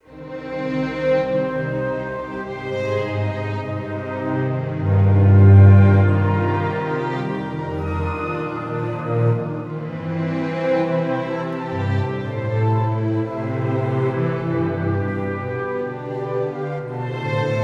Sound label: Orchestra; Albion V